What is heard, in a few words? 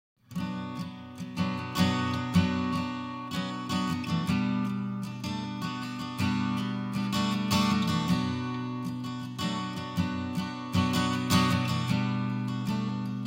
Acoustic Performance; Indie 103.1